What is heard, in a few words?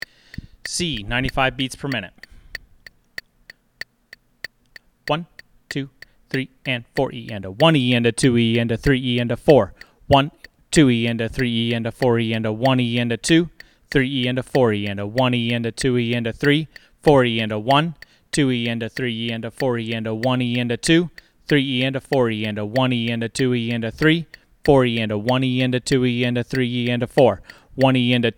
Drum Audition 95BPM; Drum Lessons